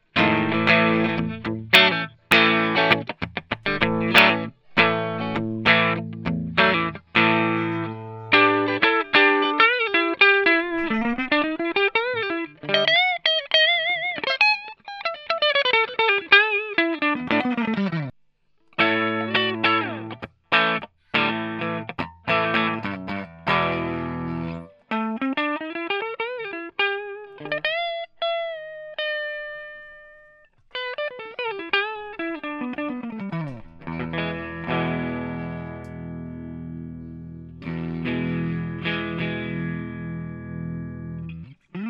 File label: clean